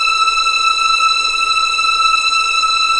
E6, VIOLINS; East West; The Ultimate String Collection

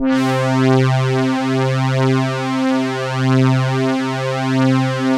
Elka Synthex Brass

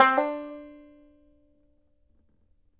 BANJO NOTES, D 4; Sampleheads; Whole Lotta Country 1 & 2

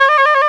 F#2, FLUTE; East West; Global Sounds

